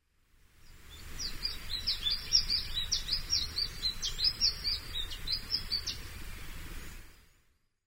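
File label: Common Chiffchaff; chifuchafu; koe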